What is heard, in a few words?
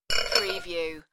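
Metal Spoon Pot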